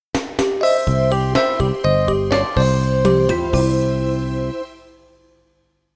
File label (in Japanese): ジングル; Tokimeki Memorial Drama Series Vol.2 Irodori no Love Song; 虹野沙希登場